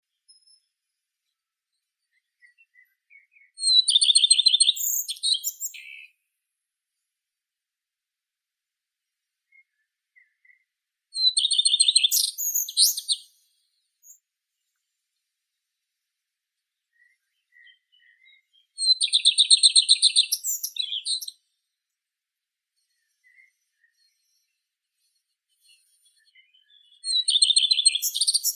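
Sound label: common redstart, Phoenicurus phoenicurus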